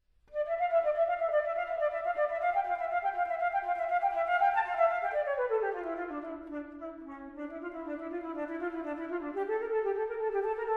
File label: flute